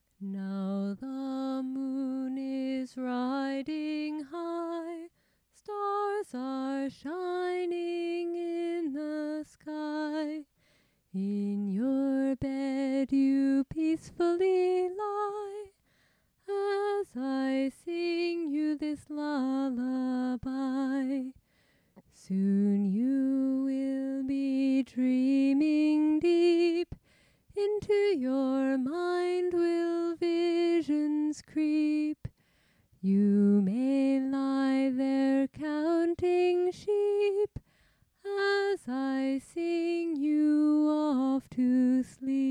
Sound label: lullabye